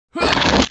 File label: ROCK